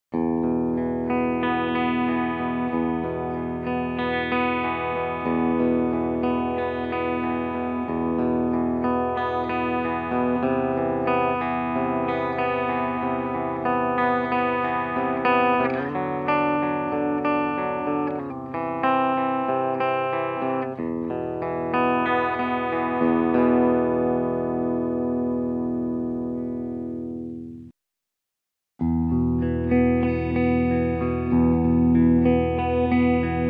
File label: EMG Clean, 7 string